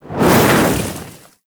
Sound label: Look Whoosh; Gameplay; Ballas; Warframe; Chimera Prologue